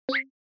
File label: Short Accent Low; ショートアクセント; 低